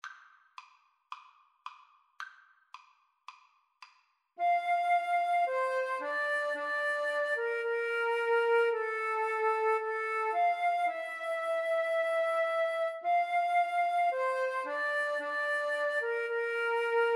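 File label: nearer my god, brass, 2 flutes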